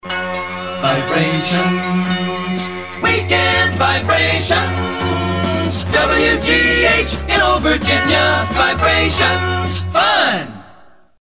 Weekend Vibrations; WGH; PAMS

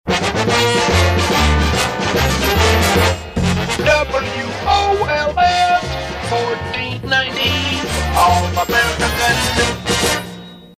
Sonovox; PAMS

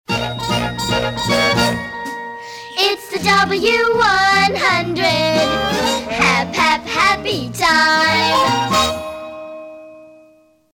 Happy Time; WIOO

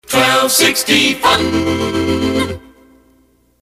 First Half Sig Fun; WNDR; PAMS

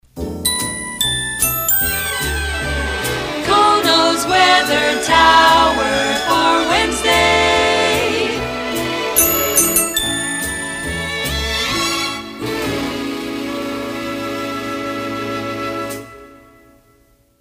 Weathermation Wednesday; KONO; PAMS

